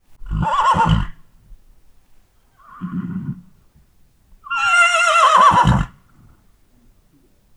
beszélgető lovak; parasztudvar

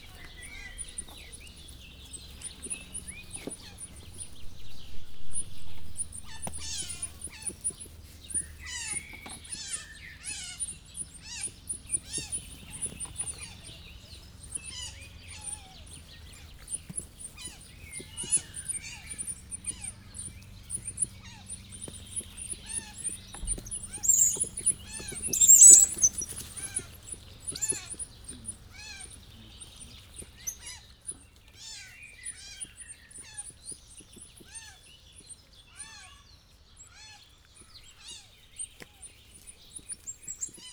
egy kis hangoskodás, ormányos medve; jaszberenyi zoo 2015

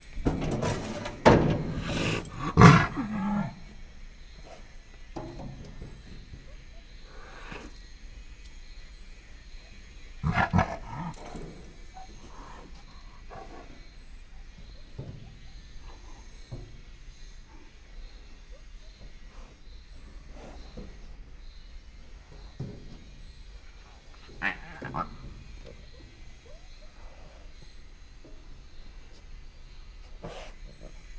hím állat kiengedése, leopárd jaguár; szegedi zoo 2011

